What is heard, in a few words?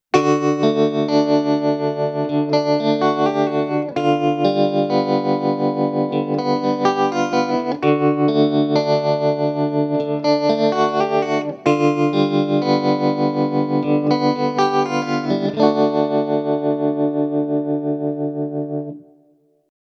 bias tremolo fast